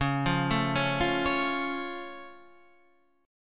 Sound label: C sharp Augmented, C sharp; C#+ Chord {9 8 7 6 6 9} arpeggiated